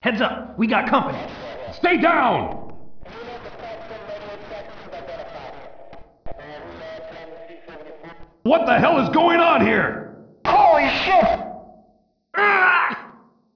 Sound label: grunts; soundroom